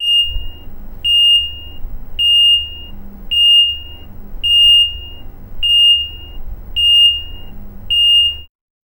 the beeping sound of a security alarm being triggered